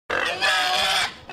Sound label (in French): cochons hi